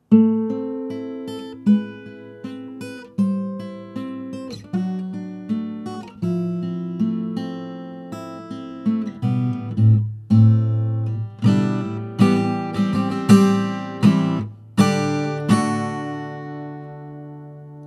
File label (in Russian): Audio-Technica ATR-1300 gitara metall, гитара (железо); Звучание Audio-Technica ATR-1300 — гитара (железо); динамических кардиоидных микрофонона; Рассмотрим 4 бюджетных динамических кардиоидных микрофонона от Audio-Technica, линейки ATR